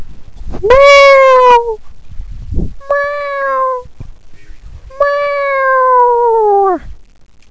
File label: meow!